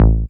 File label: DR. LOOP, BASS; X-Static Goldmine 3